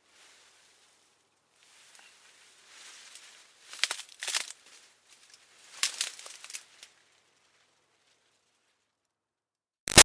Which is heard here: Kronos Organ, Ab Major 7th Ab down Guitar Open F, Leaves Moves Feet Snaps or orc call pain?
Leaves Moves Feet Snaps